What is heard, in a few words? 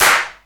hand clap sample g key; single hits one shots